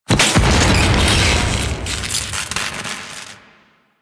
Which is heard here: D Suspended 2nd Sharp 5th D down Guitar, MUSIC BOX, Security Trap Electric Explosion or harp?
Security Trap Electric Explosion